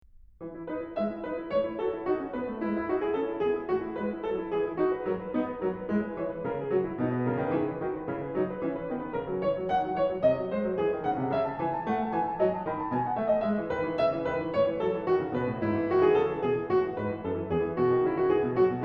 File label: Piano; Bach; Prelude in E; BWV 937